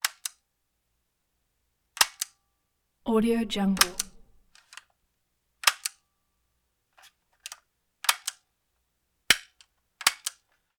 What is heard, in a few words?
m16 machine gun plugged dry fire clicks; prosoundfx